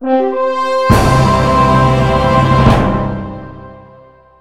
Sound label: Short Piece (You Win); Midas Gold Plus